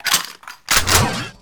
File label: Gun Reload Scifi, Weapons Foley; SFX Kit